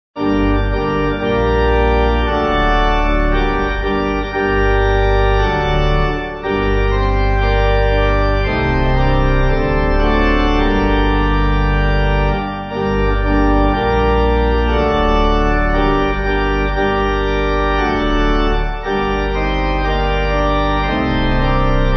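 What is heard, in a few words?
Pipe, Pipe Organ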